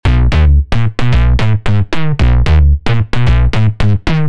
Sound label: Bass; PatchFactory